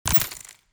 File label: ui greet dialog